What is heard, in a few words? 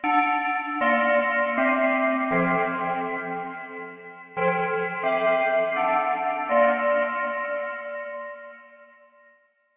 E Chime; mutsuboshi kirari windows gamerip 2004